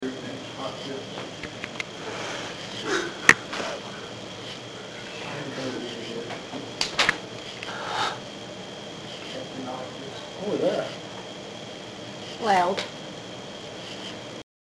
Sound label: crying